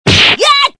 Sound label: correct